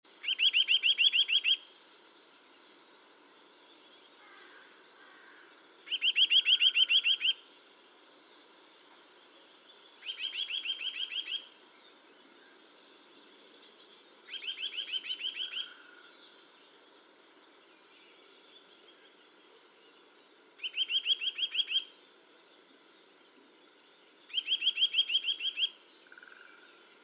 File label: Sitta europaea; SETE